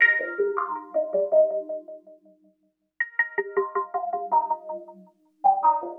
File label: SYNTH, Ambient, Keys; eJay